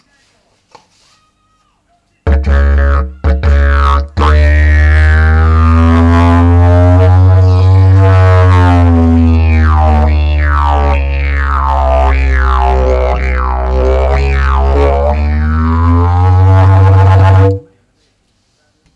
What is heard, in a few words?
Multi Didje